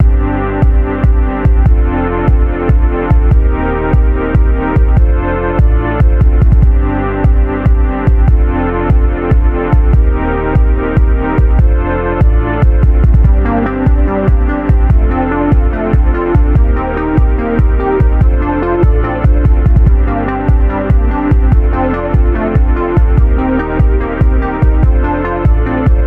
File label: Beat